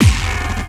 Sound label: MUD BD, bass drum; BASSDRUMS